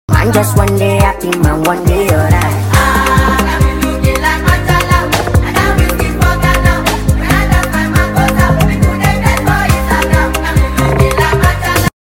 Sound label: Fast Version